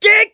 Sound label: scream while pounced, mechanic, survivor